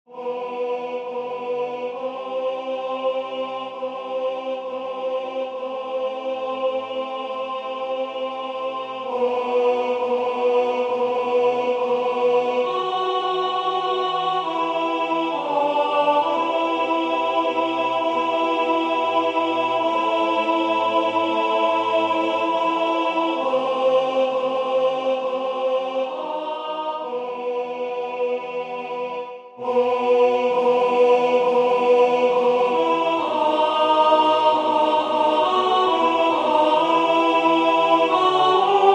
Je vous aime o mon Dieu alto

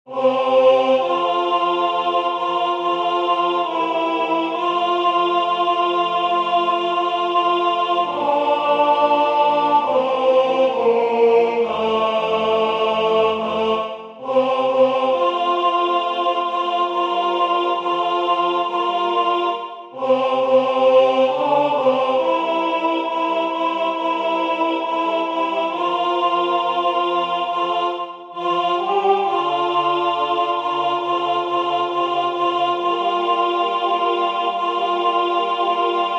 Je vous salue Marie alto

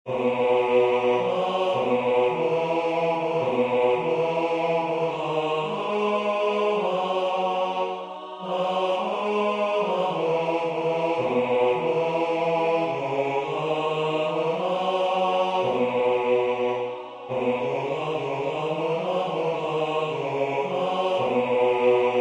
basse; Cantate Domino; Hassler; Hans Leo Hassler

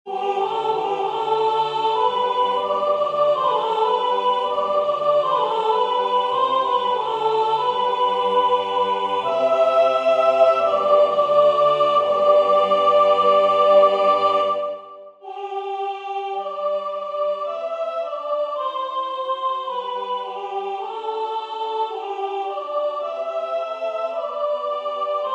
soprane; Regina coeli; Aichinger

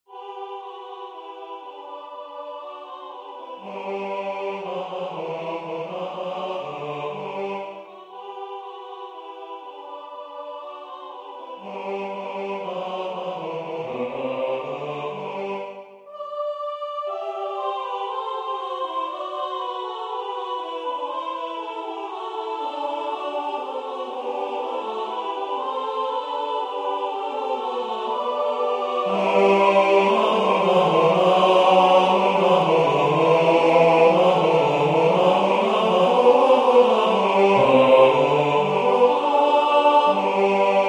Les anges dans nos campagnes basse; Gevaert

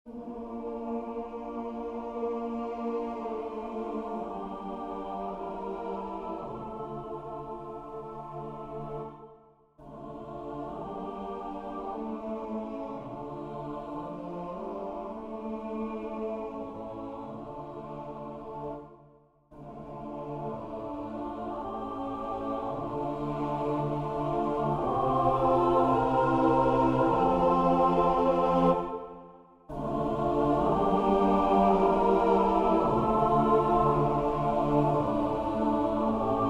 Iste est panis, Musique sacrée; Gauthier

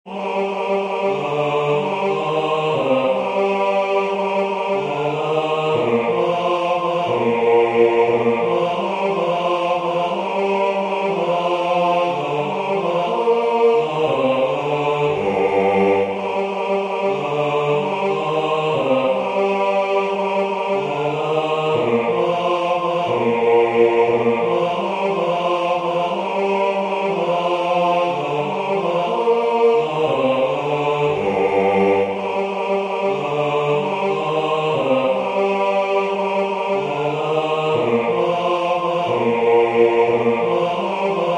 Louange a Dieu basse; Schutz

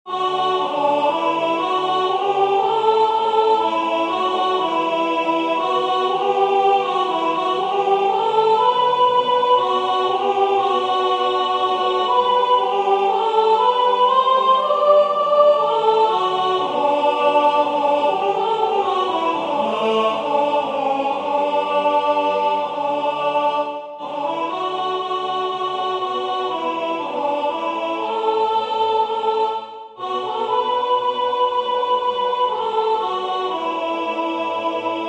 Voici le Corps et le Sang du Seigneur soprane

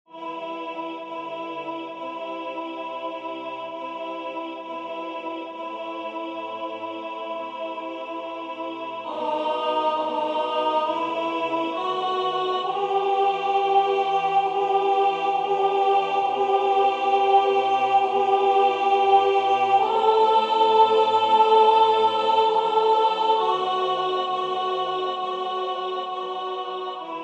Je vous aime o mon Dieu soprane

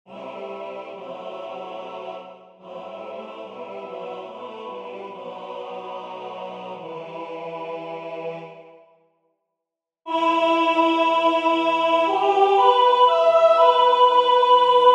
Noel tzigane soliste femme

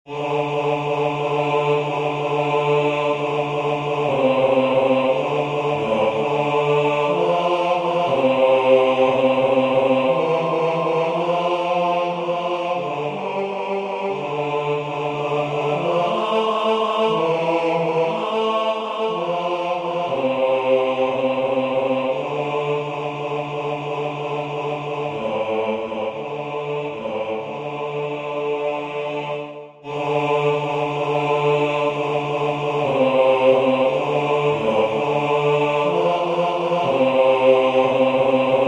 Je vous salue Joseph basse; Du Jonchay

